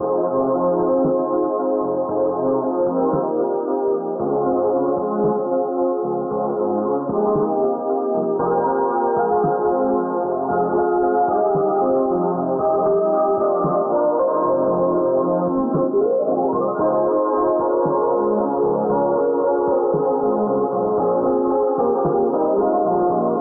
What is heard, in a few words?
keys, phonky loops